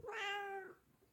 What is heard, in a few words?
Young Cat Meow